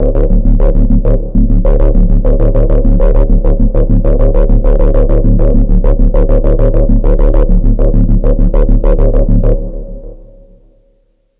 trance, Synth

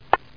key

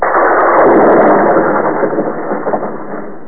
Thunder!

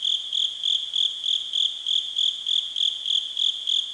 crickets; MEISJE

